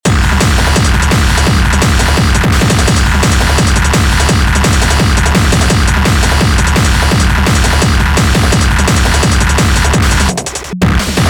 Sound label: HardCore; drum; beat; Drum Blaster: The Ultimate Beat Generator, Sampler Plugin for Modern Producers